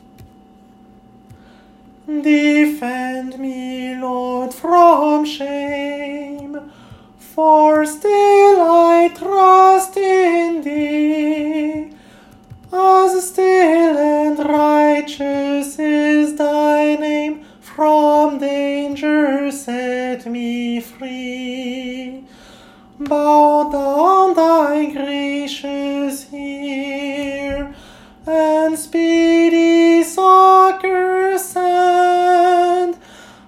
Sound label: alto defend me